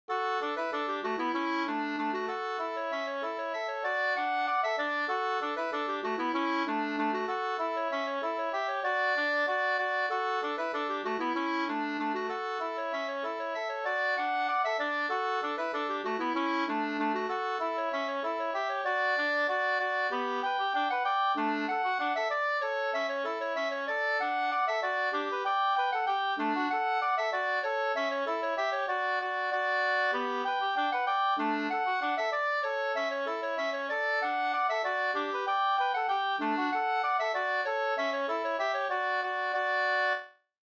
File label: polka; fr Aland fi 2st